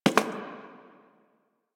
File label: Human Loid Foley Step; Warframe